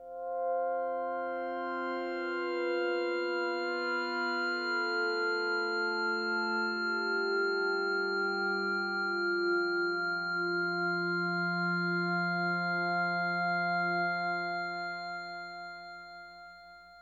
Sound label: PAD